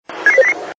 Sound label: Lapd Digitial Tone